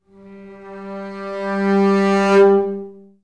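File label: basses crescendo G1; Best Service; Peter Siedlaczek's Advanced Orchestra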